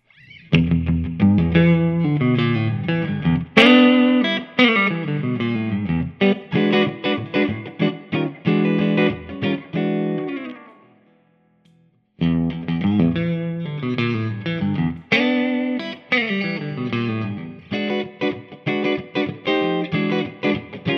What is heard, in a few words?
spalt the nouveau series, electric guitars; mucha december no 6; spalt instruments